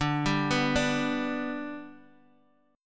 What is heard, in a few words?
G Major D, chord